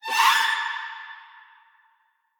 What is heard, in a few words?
stab; Zomboid 41.78.16; Project Zomboid